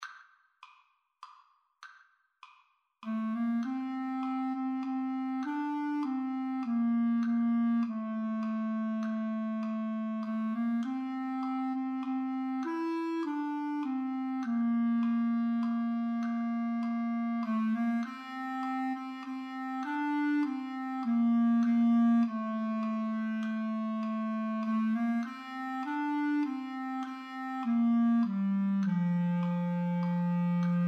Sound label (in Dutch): Mexicaans volksliedje boven